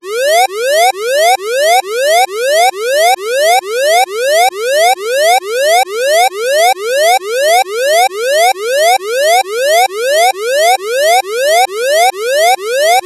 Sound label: emergency alarm siren